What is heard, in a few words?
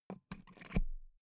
insert euro plug hard